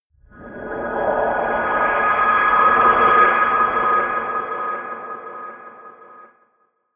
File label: air wind choir high, drones